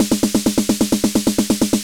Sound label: 130 trance break, Drum & Perc Loops; Trance Explosion Vol1